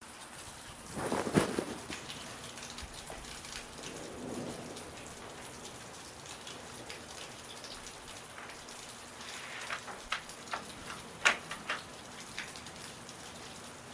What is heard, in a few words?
end of rainstorm; audiomo